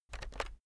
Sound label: keyboard external shortcut short